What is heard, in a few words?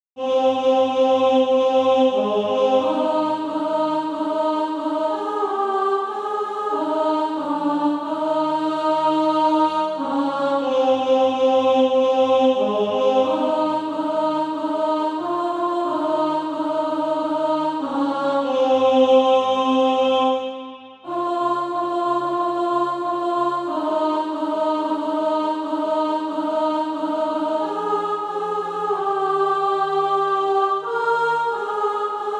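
alto, Practise Music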